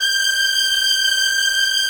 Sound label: Synths HiString G; Vengeance Samples